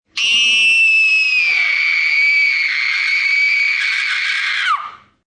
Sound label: Scream Breaking, Scream, 1 child, long, high-pitched, shriek, human voice, kid; Screaming (Sound Effects:Voice)